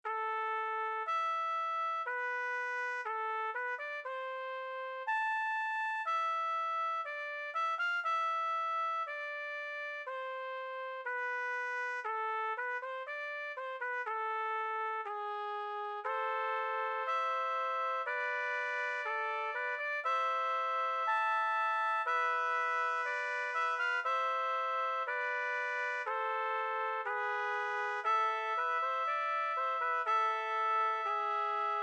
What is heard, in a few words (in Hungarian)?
C-dur kanon rezfuvosokra